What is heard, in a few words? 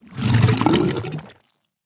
Tar Lurker, Play